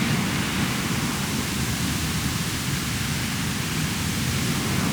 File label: emitter wind in tree